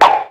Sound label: Enemy Eggholder Active; Disney Star Smash; Android, iOS, Mobile